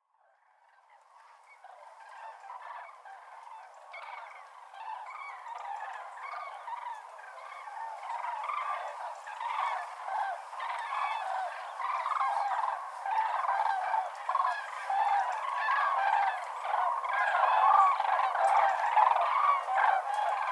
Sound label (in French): grues cendrees; Vision de Villeneuve Arjuzanx